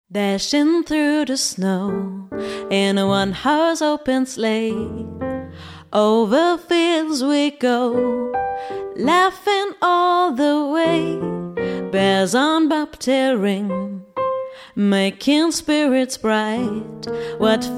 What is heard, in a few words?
jingle bells